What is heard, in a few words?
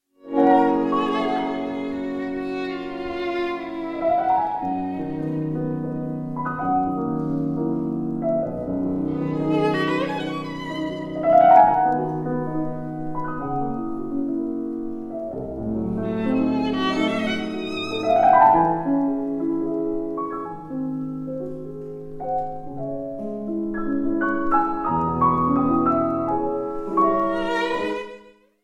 violin sonata in E flat major; richard strauss; arabella steinbacher; op 18